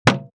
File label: drop left, single impact, large styrofoam compact block